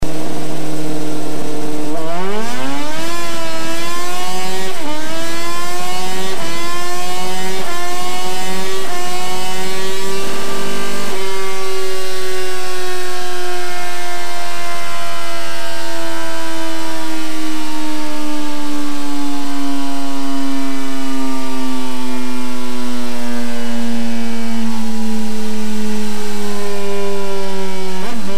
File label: Honda 929RR; 2000; Jardine pipe